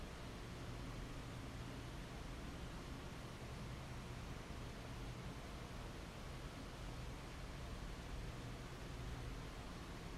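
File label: rustling long sound of factory work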